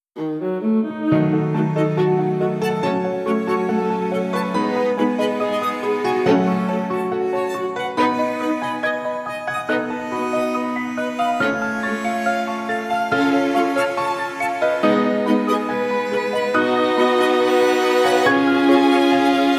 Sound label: Melodic Minor F Sharp